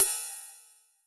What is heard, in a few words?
Ride Cymbal